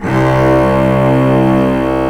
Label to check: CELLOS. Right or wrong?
Right